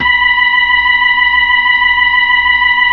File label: B-3, Brite; Keyboards of The 60's and 70's